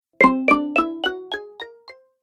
App Delay, ping; multimedia

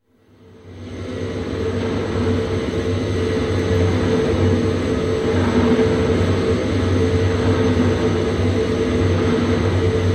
German U-Boat, torpedo room atmosphere; Boats; Vehicles; BBC

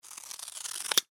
Office Scissors Cut Card Stock

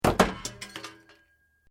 Metal Light Movement Minor; Sound Effects:Materials

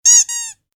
Squeak, Squeezy Dog Toy; Miscellaneous Toy Sound Effects; Miscellaneous Toy (Sound Effects:Toys); Squeaky toy; Stuart Duffield

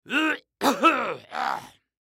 Human Cough; Clear Throat Loud Long Male Old; Clearing Throat

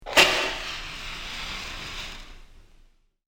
Lighting A Torch, flame sound effect; horror

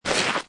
Snow And Ice Ice Scrape Small; Ice (Sound Effects:Weather)

